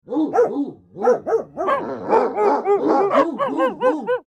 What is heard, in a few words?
Dogs barking and whining